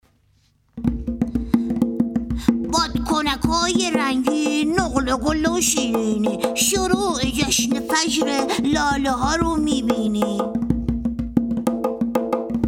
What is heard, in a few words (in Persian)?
دهه فجر